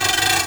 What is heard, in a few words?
Stretch Metal Arm Extend; Foley - Rattles